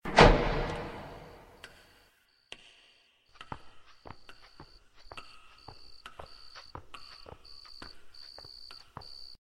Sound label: End Staircase Sound; Slendrina: The Cellar 2; Android